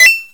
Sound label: pling